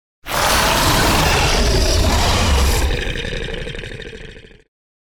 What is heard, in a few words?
Growl Beast Die - Monster Growl; Hollywood Edge; sound designer's toolkit v2